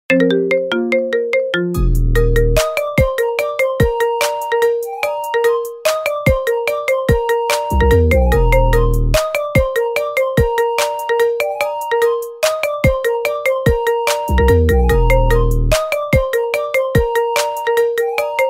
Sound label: marimba remix ringtone